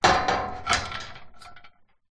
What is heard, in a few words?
industrial metal gate chained try to open movement